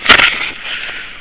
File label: PNEUMTIC